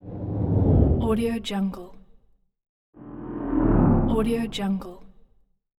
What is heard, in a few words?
spaceship fly